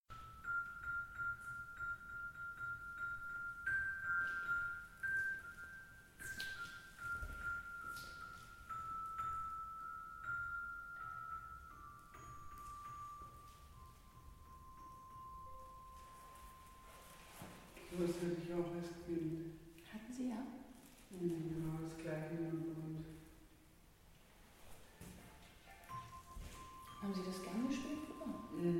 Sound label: Improvisation